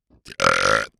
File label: Burp Belch